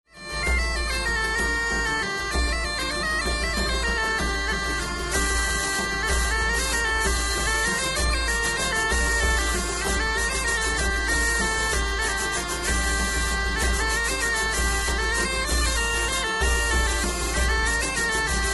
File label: hurdy gurdy bagpipes drum